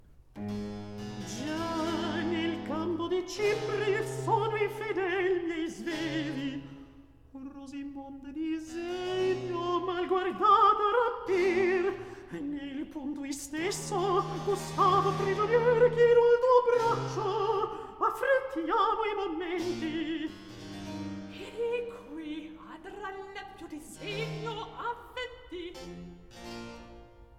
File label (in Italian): Recitativo; Gia nel campo; Handel Masterworks; Klassika; Act III